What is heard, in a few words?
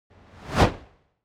elements fire torch bright flutter whoosh